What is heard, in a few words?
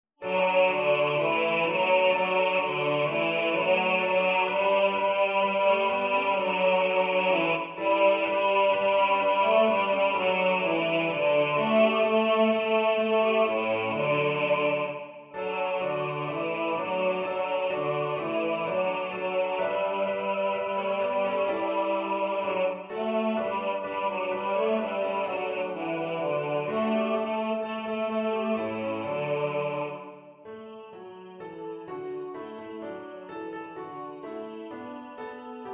May Day Carol BASS